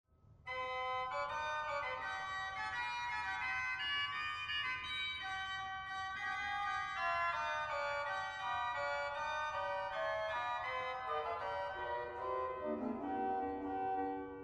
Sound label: Cornett 4f, organ; dortmund; Sauer